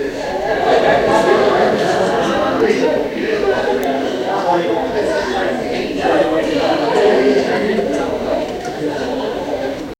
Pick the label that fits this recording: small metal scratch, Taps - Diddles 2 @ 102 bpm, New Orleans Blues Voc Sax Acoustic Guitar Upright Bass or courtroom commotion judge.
courtroom commotion judge